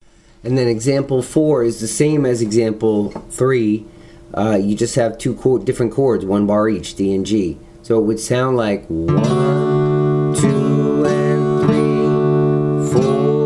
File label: strumming